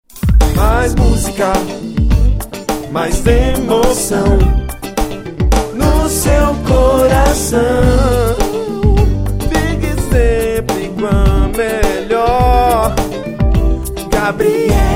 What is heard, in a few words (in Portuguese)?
vinheta; gabriela fm